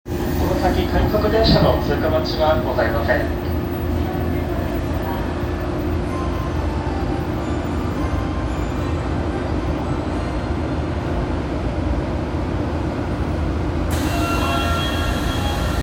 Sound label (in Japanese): 東西線浦安東, train sounds